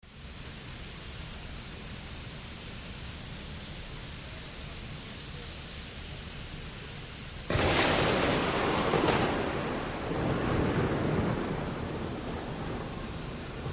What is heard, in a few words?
City storm with heavy rain, traffic, thunder, distant church bell and good wet traffic.